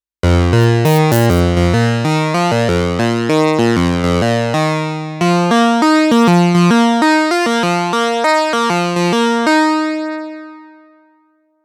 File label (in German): Sägezahn Rechteck